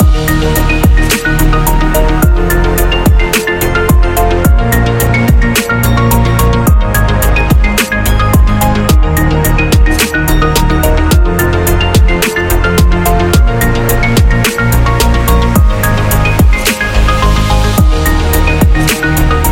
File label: Futuristic Loop